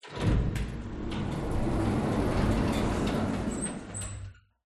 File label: Elevator Door Open